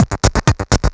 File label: Beats, Synchro Funk; Tekno; Sonic Foundry (Sony Creative Software)